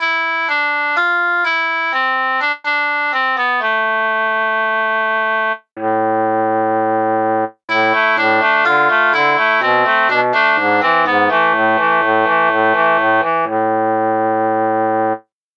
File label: shenai